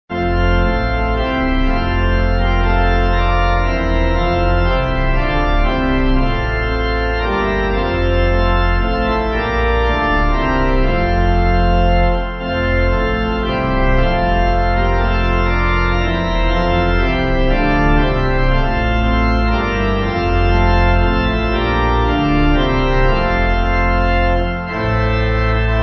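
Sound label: Pipe, hymn backing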